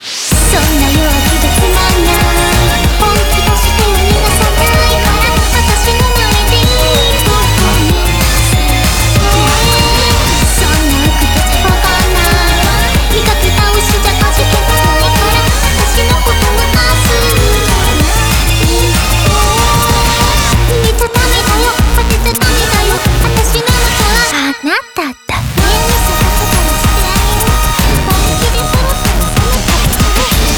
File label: BGM Reach